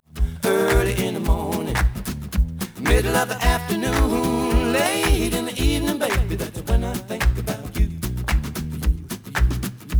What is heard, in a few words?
noised; 3bit